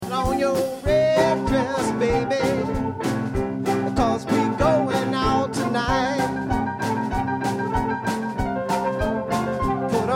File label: Blues